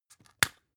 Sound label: spice jar open bright